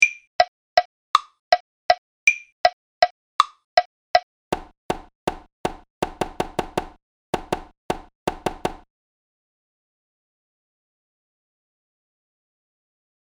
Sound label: Rhythm Dictation